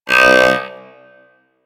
Puzzle Panel Activate; Ambience; Warframe; Corpus Gas City; Gameplay Remaster

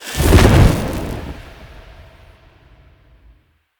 Fire Push Throw; Powers; Fenn

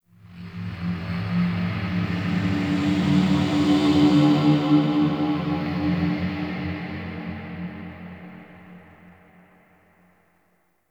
Golden Maw Ambient Texture; Warframe; The War Within

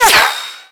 Bullet Whizzby; Warframe; Bullet Impacts; Whizzbys

